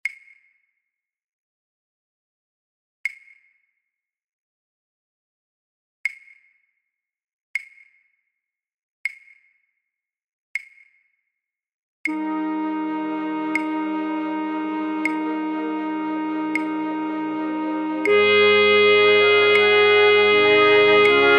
fluitorkest Altfluit; voor oefentracks; Matthaus Passion

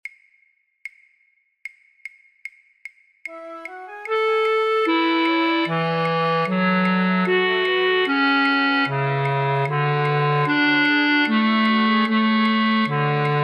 Ritournelle flute choir basfluit; voor-oefentracks; Rameau; Platee

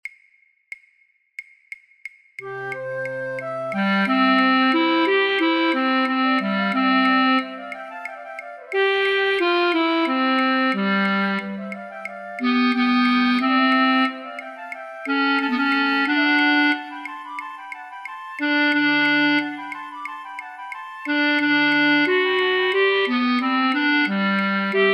Marche pour la Danse flute choir altfluit; voor-oefentracks; Rameau; Platee

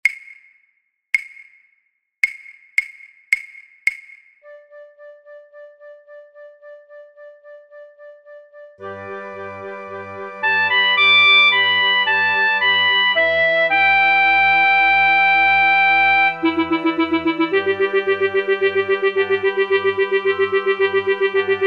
fluit

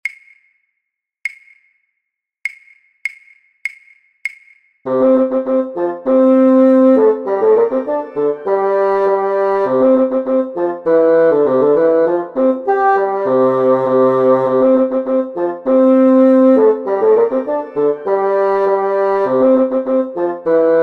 basfluit

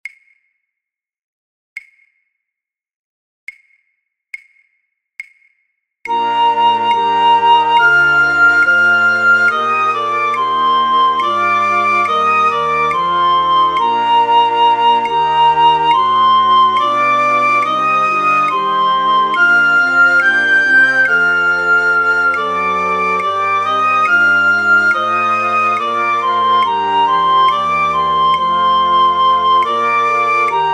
Matthaus Passion fluitorkest Piccolo; voor oefentracks

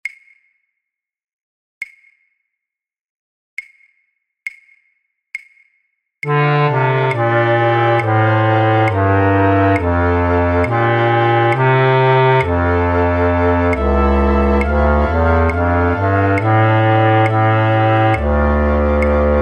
fluitorkest, Contrabasfluit A; voor oefentracks; Matthaus Passion

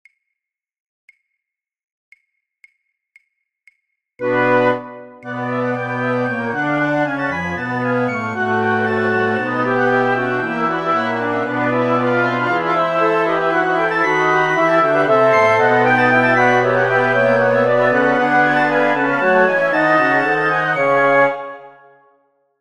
Matthaus Passion fluitorkest alle partijen; voor oefentracks

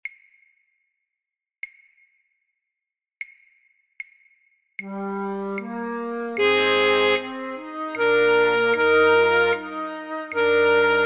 fluit; Holst; Jupiter; Andante maestoso

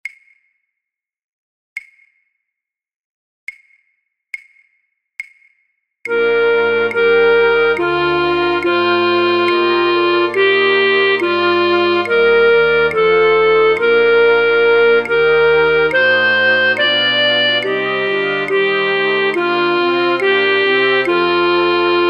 fluitorkest, Fluit; voor oefentracks; Matthaus Passion

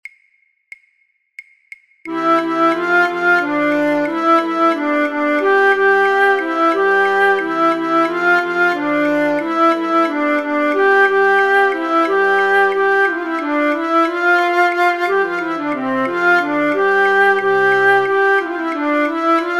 Branle flute choir altfluit; voor-oefentrack; Rameau; Platee

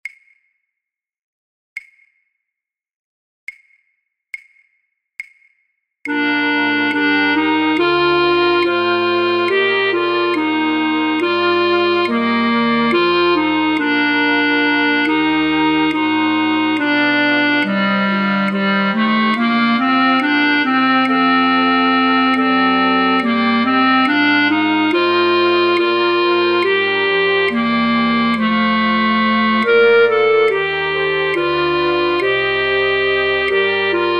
Matthaus Passion fluitorkest Altfluit; voor oefentracks

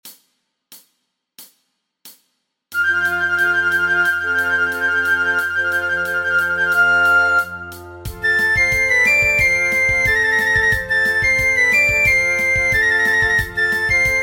oefentracks piccolo

